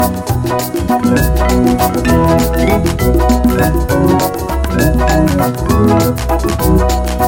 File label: Beautiful Jazz Waltz